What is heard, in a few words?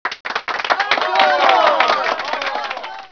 Game Success; FishingBass